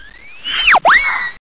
Head Spin; How Many Bugs in a Box

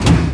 GEAR; PC Gamer IT CD 31; SegaTC

